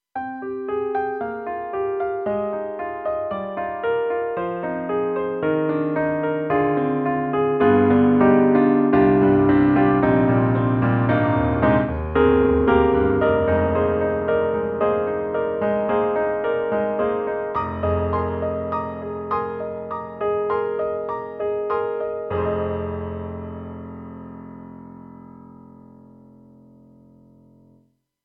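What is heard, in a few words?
Dark Warm Grand, piano; KORG M3 XPanded Program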